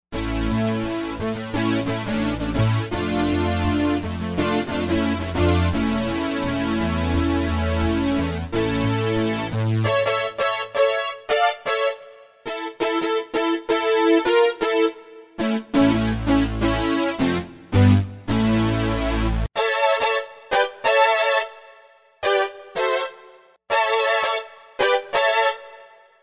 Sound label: Analog Power, Korg TR-Rack